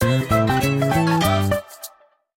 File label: Music Intro; My Tamagotchi Forever; android; GSFX 2048 Music Intro